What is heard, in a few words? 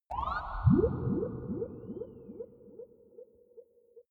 lightspeed jump signal